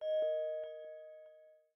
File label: Folder Close; Samsung SM-W2018